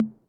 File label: High tom